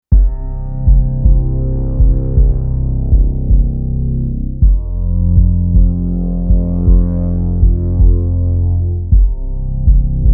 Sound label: Instrumental